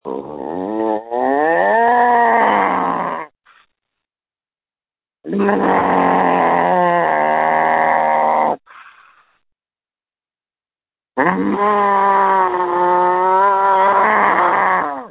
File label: scream